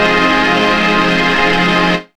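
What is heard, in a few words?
synth pad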